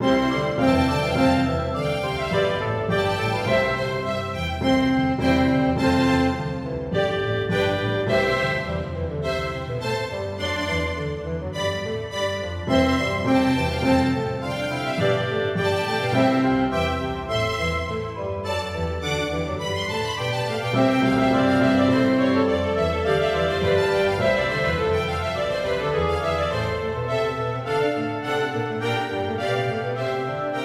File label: Choir Accompaniment; Haydn; Mass No 10 Missa in tempore belli (Paukenmesse) Hob 22:9; Credo; Reheasal Aids for Choirs and Soloists